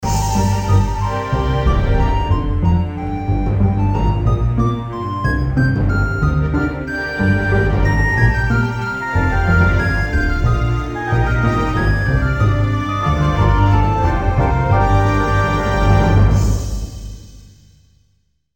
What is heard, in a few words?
Battle Won; Heroes of Might and Magic: A Strategic Quest; 3DO; MS-DOS